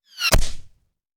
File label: Bullet Impact; Sound Ideas; Guns